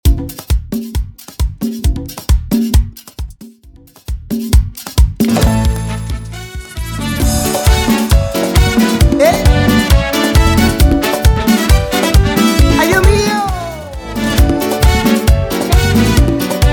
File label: Intro Outro 134BPM